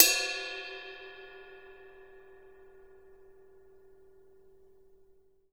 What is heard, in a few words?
RIDE BELL